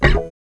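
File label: GUI Rutsch rauf, acoustic guitar; Best Service Gigapack 2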